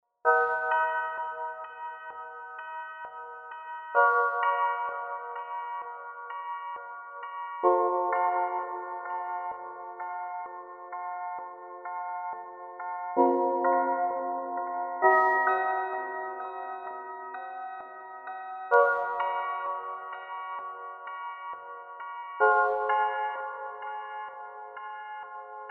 synth delay, roland jv880

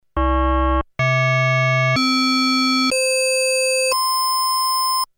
Metal wave created by ADDITIVE, Korg DSS-1; ADDITIVE is a non real-time process; main core based on 8 bits CPU Intel 8085 manages 8 voices polyphony; ADDITIVE is a non real-time process based on 12

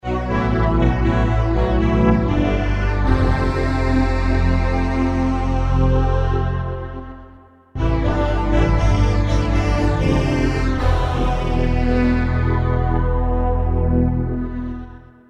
synth, yamaha sy99

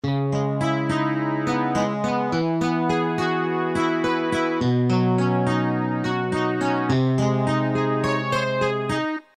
guitar, Korg N1R

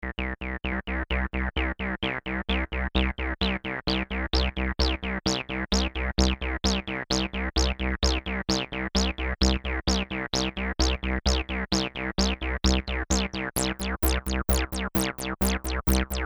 vs accent, Cyclone Analogic TT303 Bass Bot

